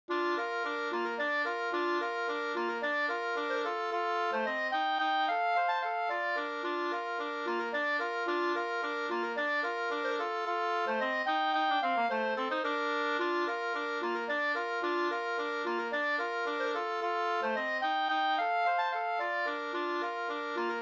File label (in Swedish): slangpolska 2st